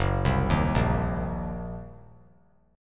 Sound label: Gb 6th Flat 5th Gb down; arpeggiated; Gb6b5; JGuitar